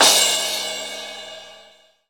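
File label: cymbal crash; Best Service Gigapack 2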